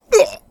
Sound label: Male Hurt